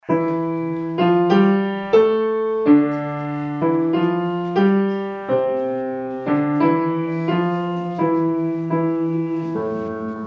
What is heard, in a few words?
Hymn; By the Waters